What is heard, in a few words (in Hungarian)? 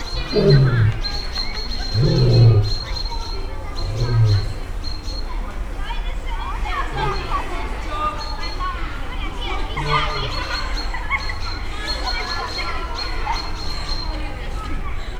hím oroszlán távolról; szegedi zoo 2011